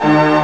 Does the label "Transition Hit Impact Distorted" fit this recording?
No